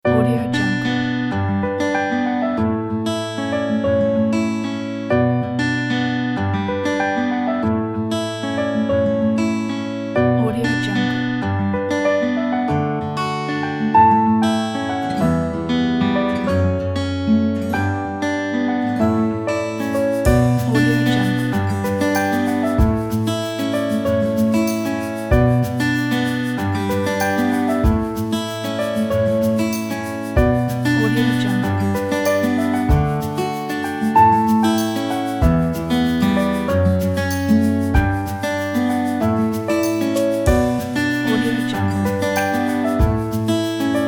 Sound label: sad acoustic